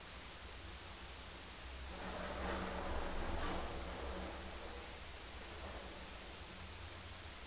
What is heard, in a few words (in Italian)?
Movimento meccanico